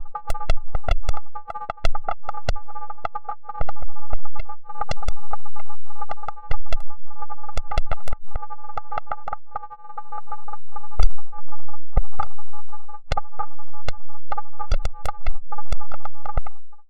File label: machine code, weird; Zero-G Ambiosis